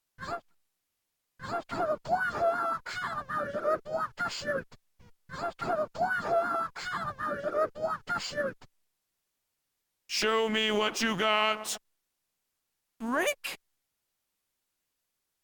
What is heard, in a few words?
TE PO 137 Samples